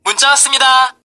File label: Text Tone; Yoosung